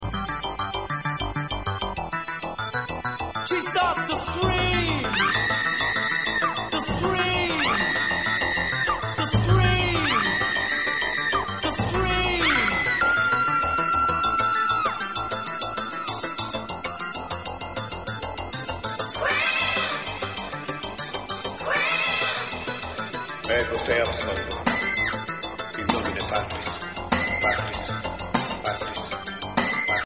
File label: extended remix